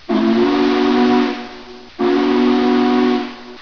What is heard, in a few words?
hoot